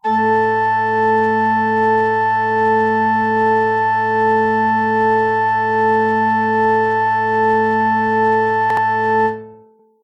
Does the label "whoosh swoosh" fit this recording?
No